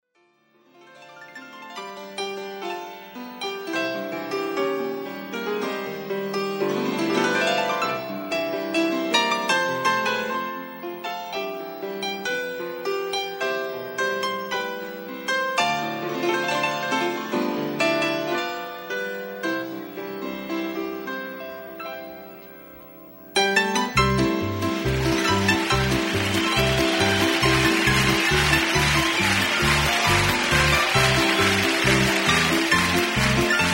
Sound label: piano medley